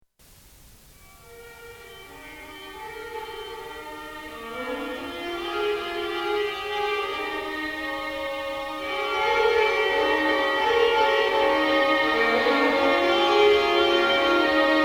trio violon

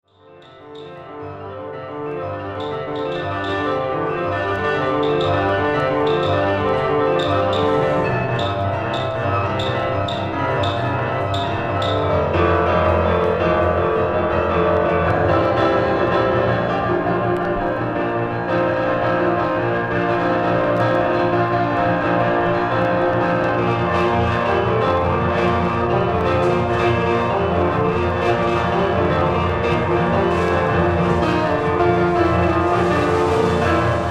musique action

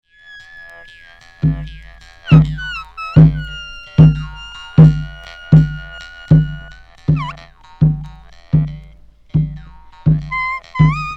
musique triangle

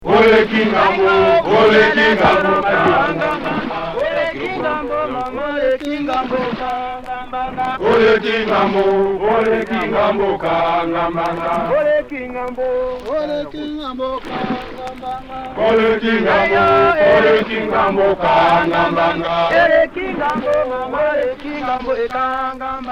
pygmees moyen congo

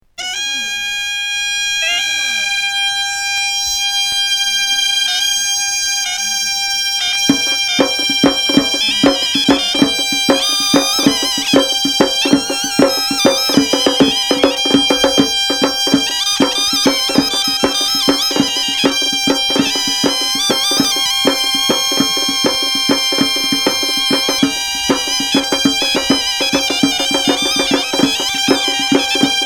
musique grece

